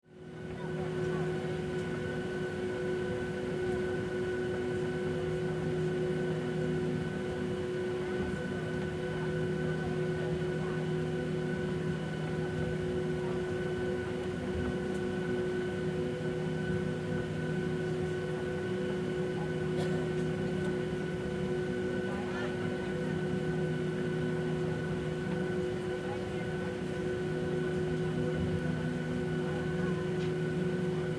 Vehicle Airplane On Ground Interior Commercial Airline